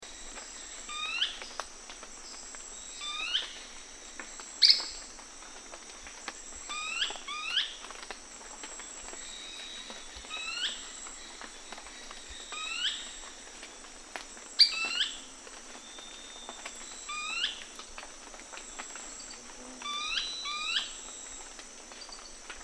Brownish twistwing; پرنده تابیده بال قهوها

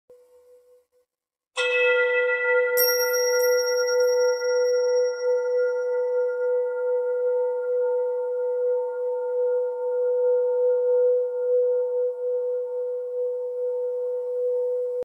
Frequency 369 Hz

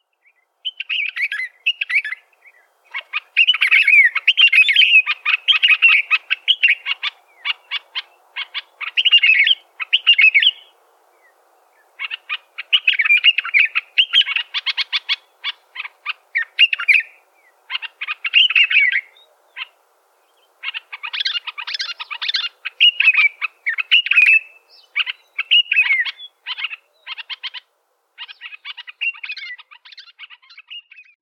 African red eyed bulbul; پرنده بلبل چشم سرخ

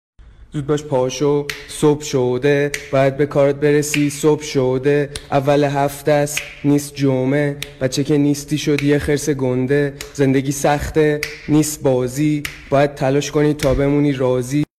alarm; بیدار شو کنکوری; konkori

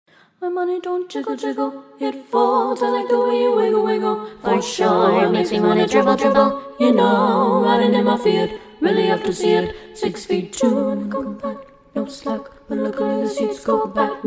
Choir; گروه کر

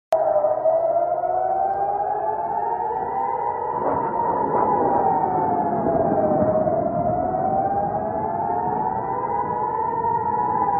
sirens, آژیر خطر جنگ; Iran

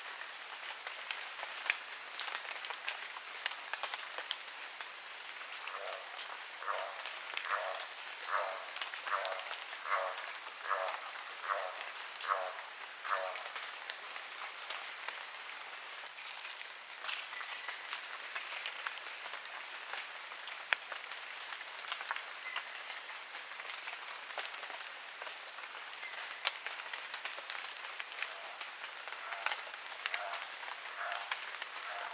Black tailed trogon

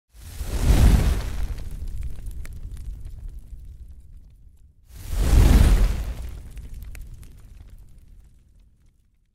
fire movement

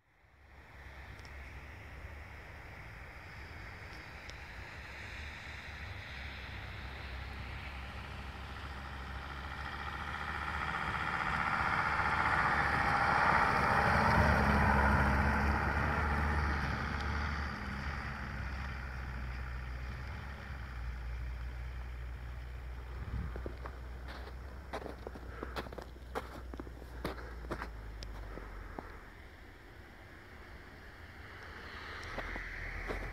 wheel chain

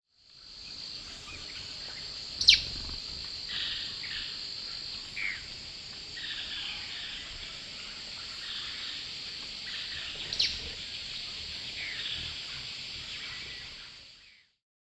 Dusky throated antshrike; پرنده آلاگزنهای گلوتیره

